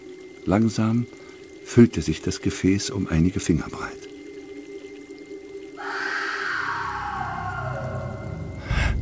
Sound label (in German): schrei